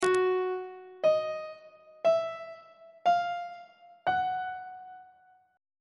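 f sharp diminished 3rd, minor 3rd, major 3rd, augmented 3rd intervals on treble clef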